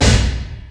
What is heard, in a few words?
Snare, Alesis D4fx; Drums